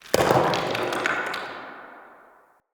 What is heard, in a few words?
Day Rock Slide Cliff